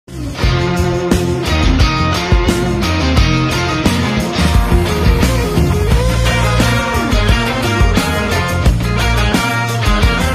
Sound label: telugu ringtone; saripodha sanivaaram